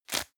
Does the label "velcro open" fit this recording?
Yes